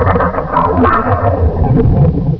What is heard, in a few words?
unthunder